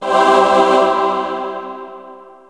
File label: ahh